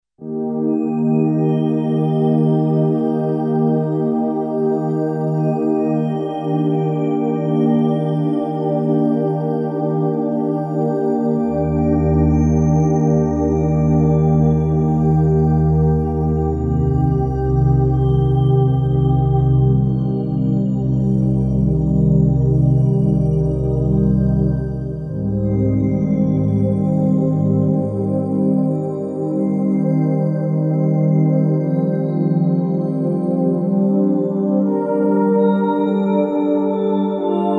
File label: Pad Tropolis